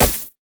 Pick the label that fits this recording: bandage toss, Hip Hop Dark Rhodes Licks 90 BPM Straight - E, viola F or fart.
bandage toss